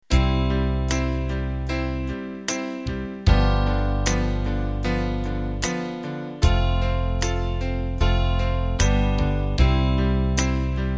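Pop, Fingered Electric Bass, Bright Piano, Muted Electric Guitar and Electric Piano 2; Cavern Pop; ballads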